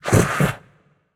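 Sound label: "heh heh", nonverbal; smite; khepri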